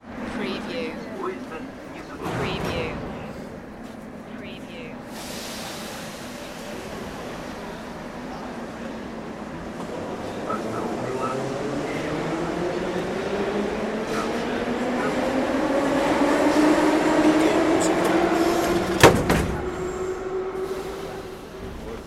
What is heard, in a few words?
metro train ride moscow